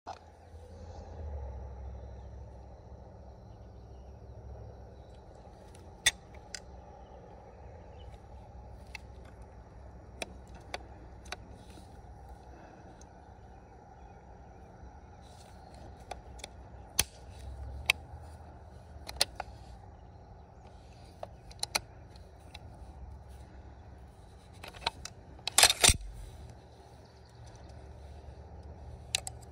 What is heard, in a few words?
Arisaka, تفنگ; تفنگ آریساکا